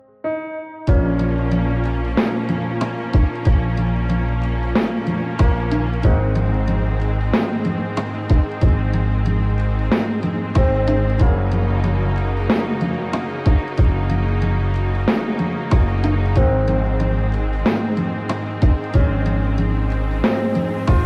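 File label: piano music ringtone; fortepiannaya muzyka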